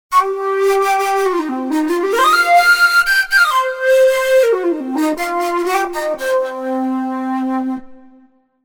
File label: Vox Flute